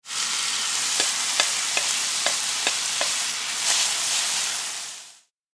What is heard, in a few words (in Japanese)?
焼きそば を 焼く